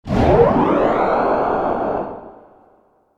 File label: Power Up